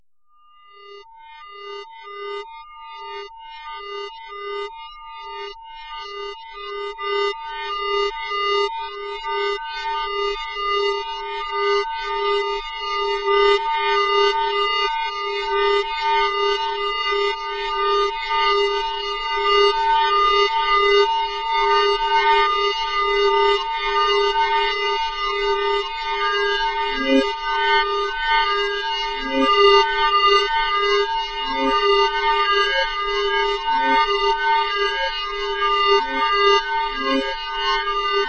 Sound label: guitar